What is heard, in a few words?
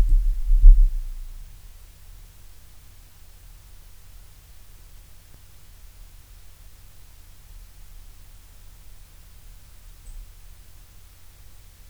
1000mV acc self noise, accelerometer